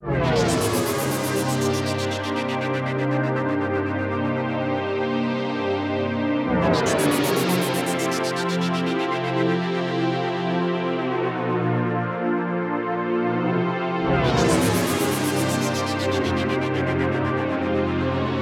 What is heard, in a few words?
Glider, Korg Radias-R